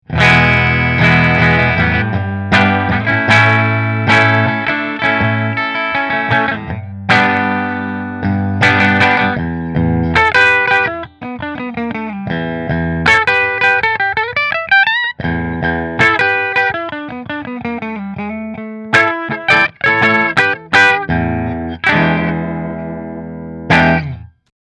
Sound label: Rahan Position 5 Fender, guitars; Rahan Guitars RP Double Cutaway Green Flame Maple Top